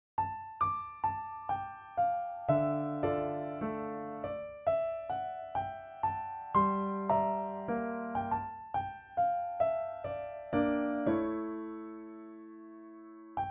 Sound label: Piano Solo; Endecha y Oremus; Francisco Tarrega